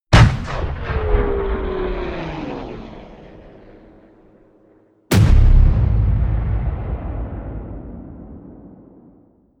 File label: air strike